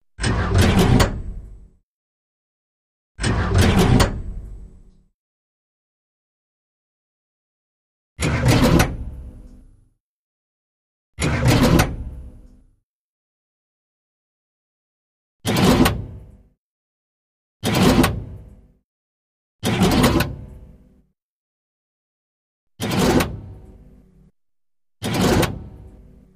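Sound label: Metal Lever Mechanical, levers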